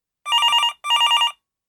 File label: ringing